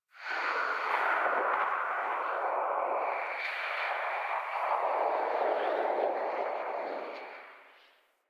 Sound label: Atmosphere Gust, Ambience; One Offs; Warframe; Venus Open World; Venus Landscape